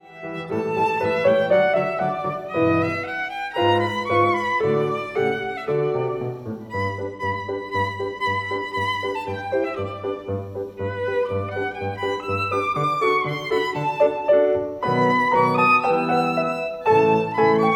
VIOLIN; Trinity College London violin exams for 2016-2019; Mollenhauer: Allegro from The Boy Paganini Fantasia

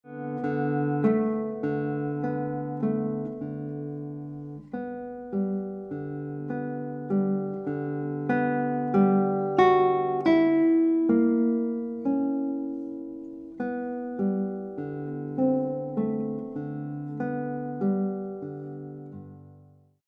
Guitar

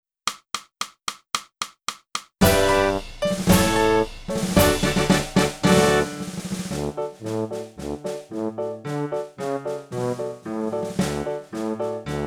flute

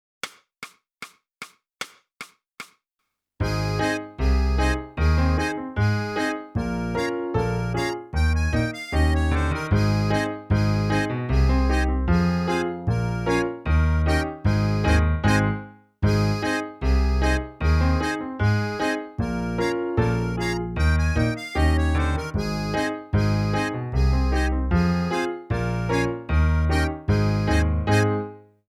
sax, the steamboat

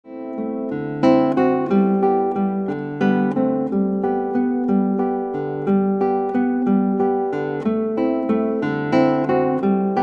Guitar

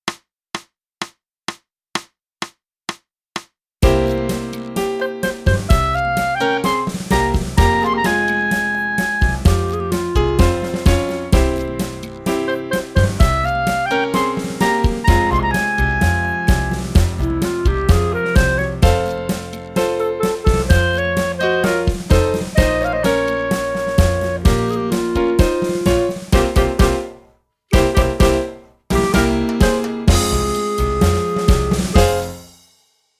clarinet

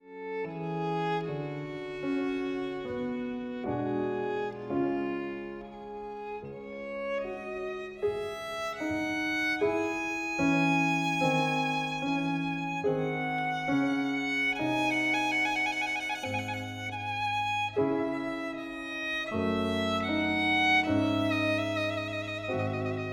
VIOLIN; Trinity College London violin exams for 2016-2019; Handel: Largo & Allegro (3rd & 4th movts from Sonata no. 5 in A)

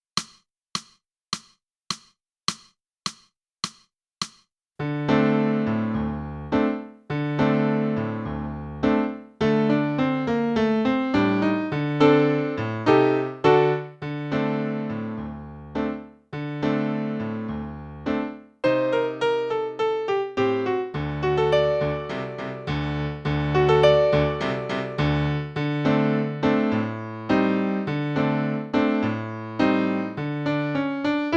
clarinet

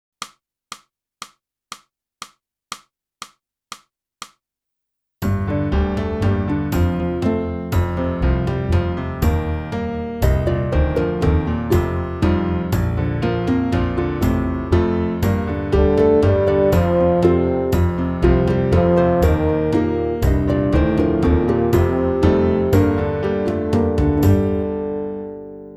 clarinet; boosey method

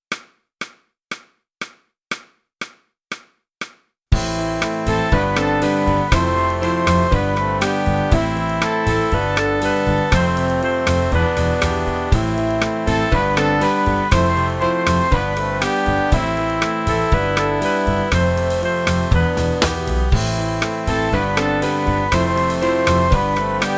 clarinet

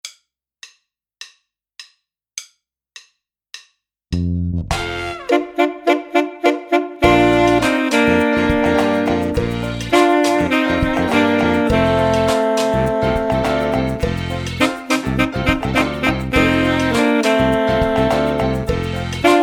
Full Sax

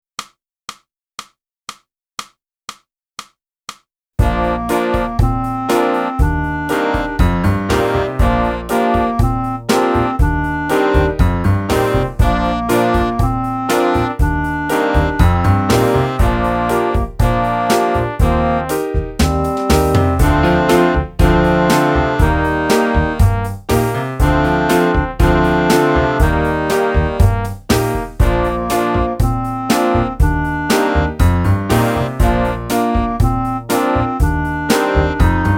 flute; boosey

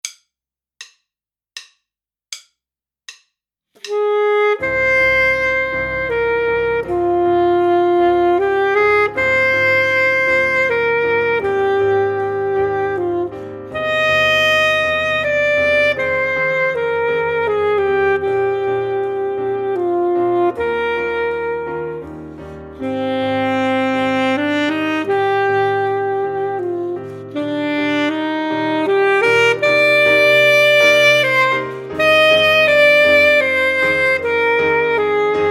Romance Full Sax

